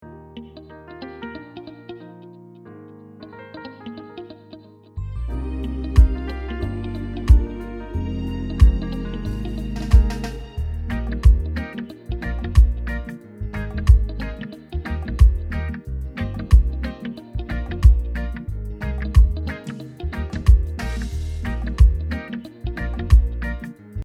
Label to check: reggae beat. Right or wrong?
Right